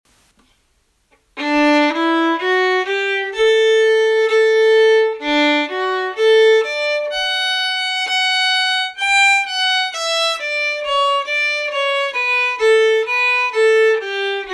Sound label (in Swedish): Jubileums Schottis långsam; Allspelslåtar 2019